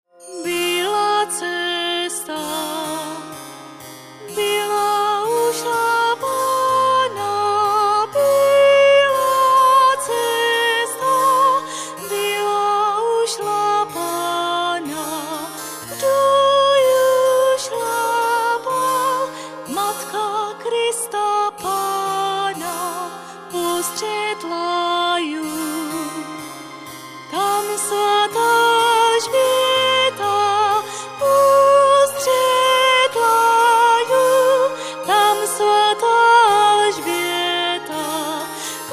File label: Sacred Voice